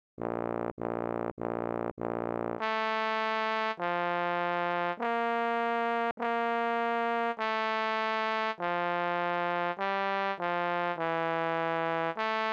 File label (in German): Tenor; Blaeser